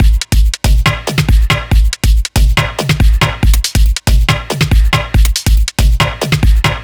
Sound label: drum loop, Dubstep; Dubstep Sample Pack